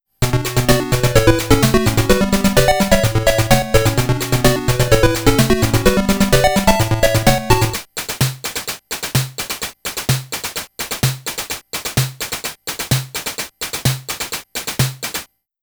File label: Interval